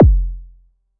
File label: bass 808 hash F; Polyend; ARC Noise